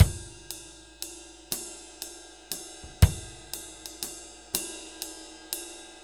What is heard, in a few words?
6/8 Loop, Drum Tools, Laid Back